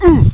Ooph; BabyLabyrinth

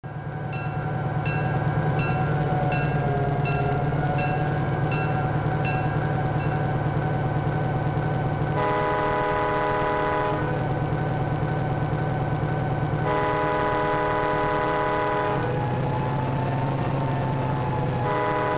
diesel sounds; Railware

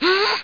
gasp

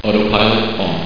auto p off

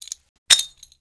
grenade pull, weapons foley; Spearhead Demo; MacFormat 2003 June

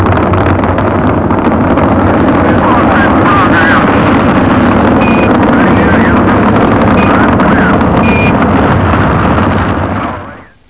blastoff; EarthLink Network ExpressNet Suite; Internet Coach

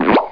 pop

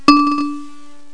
xphone

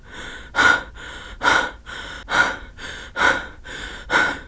breath run; The Sum of All Fears

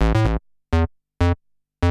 SNTH BASS PROGR 125 A; eJay

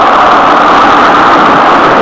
storm, nature; Sound Effects for Multimedia - Volume 1

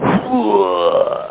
blurrrgh!; charley cat

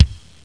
kick; Descent

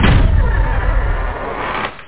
door

